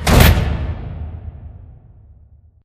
sliding door lg verb close start; Titanfall 2

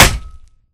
Imp Bullet Light Ballistic Solid Metal

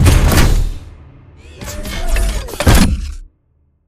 bt embark casual air grab; Titanfall 2

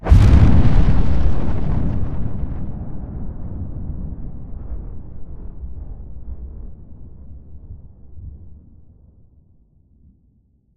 dish distant explosion; Titanfall 2

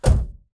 footstep jog; Titanfall 2

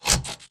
bt footstep jog parts; Titanfall 2

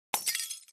imp bullet lightballistic solidglass debris

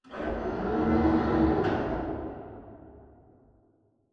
Robot Arm Pass Receive Approach Dist; Boomtown; Titanfall 2

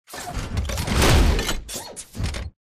melee high spin punch forward; Titanfall 2

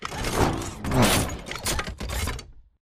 melee charge sweep forward; Titanfall 2

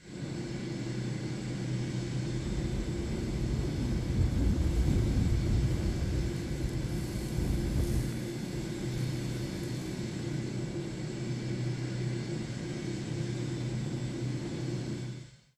evac hoverint; crow; Titanfall 2

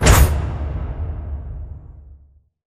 sliding door lg verb close stop; Titanfall 2

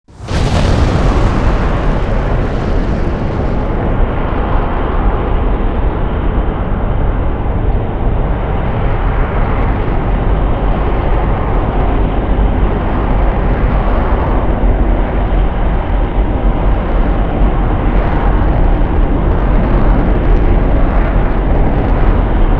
large ship takeoff; Titanfall 2

